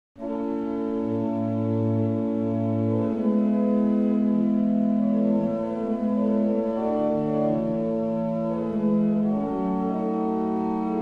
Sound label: Portugal New, barrel organs; Avington